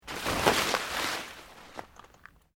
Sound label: PLANT LEAVES LARGE MOVE FAST, rustling